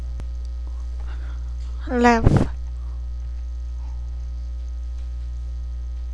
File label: laugh